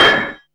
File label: BLACKSMITH